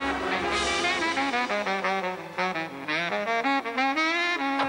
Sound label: solo